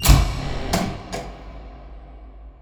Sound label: push bar door open; doors